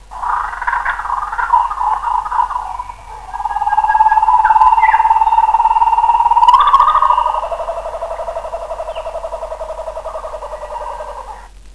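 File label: kookaburra; zoo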